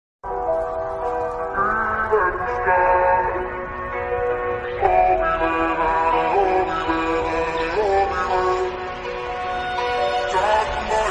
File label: bgm ringtone attitude ringtone